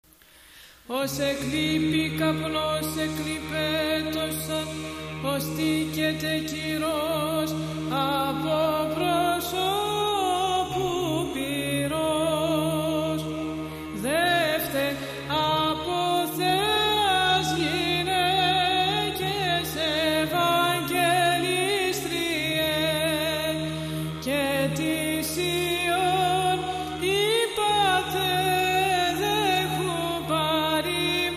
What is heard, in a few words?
Pentacost chant